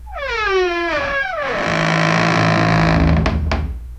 old wooden door slowly op, creak

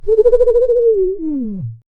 when the snake shakes its, rubber band

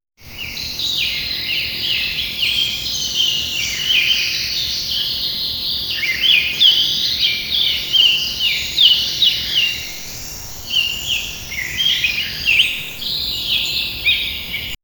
birds sound in forest

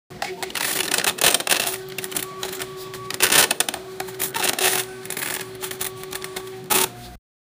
cash register cha-ching

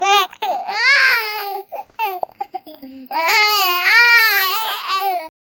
the child is eating while crying

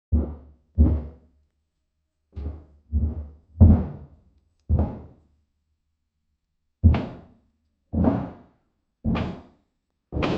horror sound effect of fo, doors

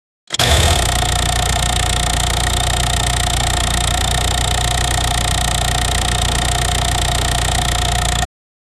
v6 gasoline engine sound

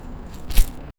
picking strawberry, plant movement

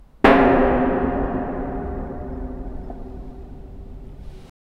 grinding metal sharp spar

